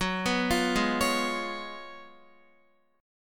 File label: Gb Major 7th Suspended 2nd Suspended 4th, Guitar